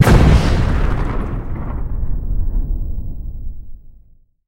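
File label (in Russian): направленный далекий взрыв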